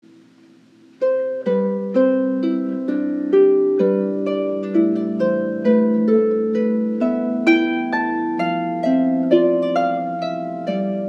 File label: Pachelbel's Canon, harp; weddings and special events